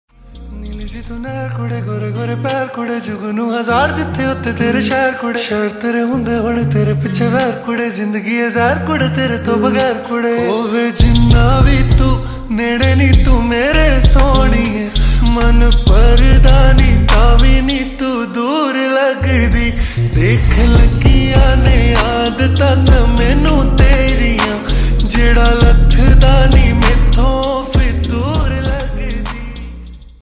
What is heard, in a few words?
Slowed Reverb Ringtone